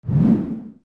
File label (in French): bouton construire fermeture; monopolygo